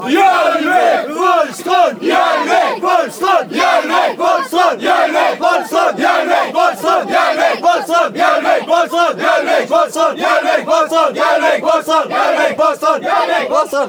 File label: cry, fanchants; valestrand hjellvik